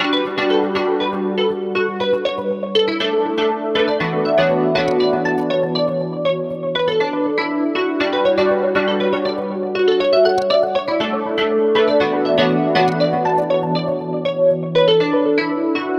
Electric200 Stereo

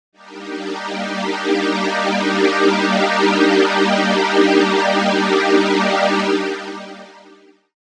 iZotope Iris 2

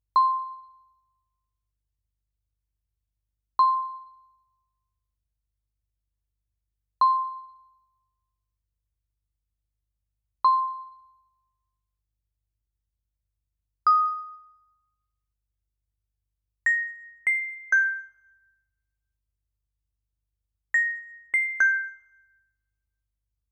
Chromaphone

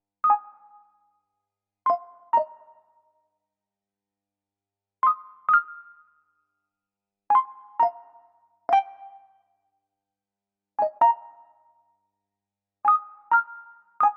rhodes; rhodes 2

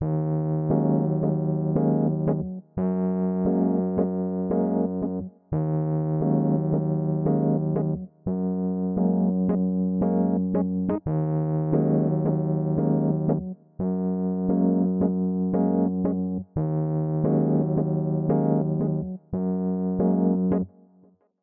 Electric200 Stereo

